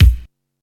2000s loud kick drum sample a sharp key, Royality free steel kick drum tuned to the A# note